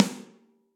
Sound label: Snare & Press Ambient Loud Punch; Drum Hits; Ableton; Factory Packs; Session Drums Studio; MPE Hits